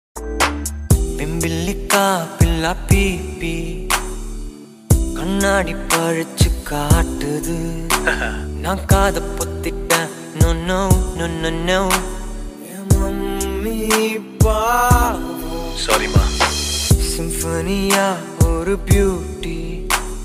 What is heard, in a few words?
tamil love ringtone; caron tunes